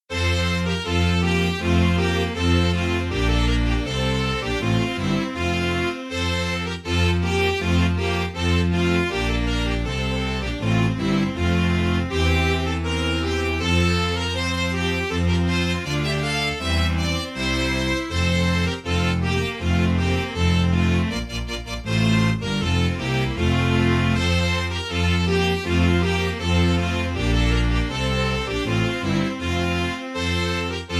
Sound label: Deck The Halls